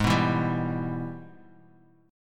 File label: Ab Minor Major 7th Sharp 5th, down, Bass Standard; strummed; JGuitar